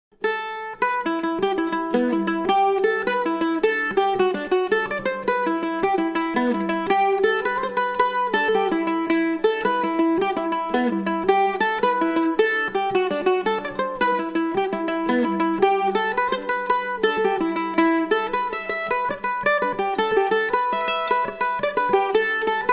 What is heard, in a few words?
mandolin; strayaway